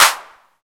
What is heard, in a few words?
clap